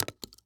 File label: Weapon Bullet Shell Shotgun Multiple Shells Fall On Dirt Ground; AdobeCC